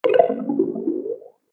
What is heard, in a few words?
Magic Tokens Underwater, video game